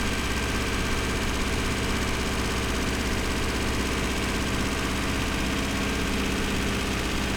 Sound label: Subaru Legacy 2.5 GT, Designed, 2k RPM loop; Soundholder